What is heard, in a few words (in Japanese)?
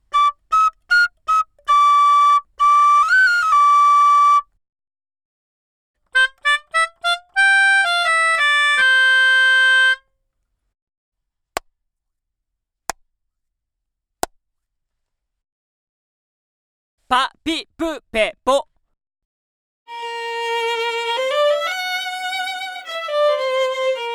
ピアニカ、クラップ、声と、打ち込みのバイオリン, ドライ, 元の音です; 元の音です。防音室はNeumann M269とTelefunken V76のシミュレートで収録。＋1176系コンプ。; 打ち込みバイオリンはVIRHARMONIC Bohemian Violinです。